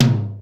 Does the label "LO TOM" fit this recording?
Yes